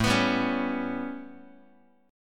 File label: A 9th A, Guitar; strummed